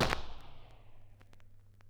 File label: oneshot Bm 142, shaker; percussion